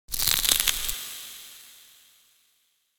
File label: flame